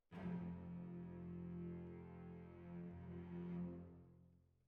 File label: Violins Over